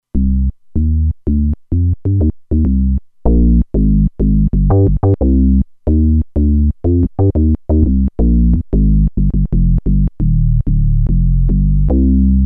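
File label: Squeaky Bass, Roland Alpha Juno-2; Factory 2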